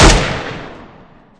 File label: mp7 fire; weapons; covert